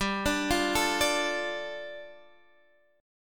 G 7th Suspended 2nd G Guitar Half Step Down

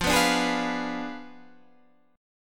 F Diminished 7th F down Guitar Standard; Fdim7 strummed; JGuitar

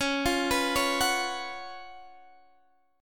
Db 11th Db, down, Guitar Standard; arpeggiated

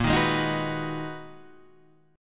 Ab Minor 7th Bb chord; Abm7/Bb; strummed; JGuitar

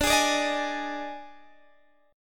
D sharp Major 7th Suspended 4th, Guitar

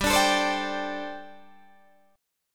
G Suspended 2nd Suspended 4th, Guitar Open D

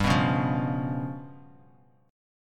G 7th Flat 5th down Bass Standard; strummed; JGuitar

